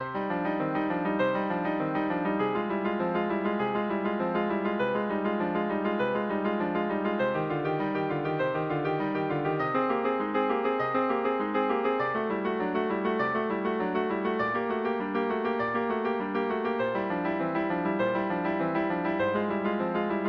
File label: prelude c minor, piano; bach